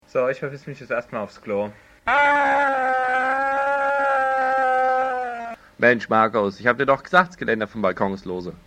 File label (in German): TOILETTE